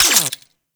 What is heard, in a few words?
Quill Dart Gun Electric Mech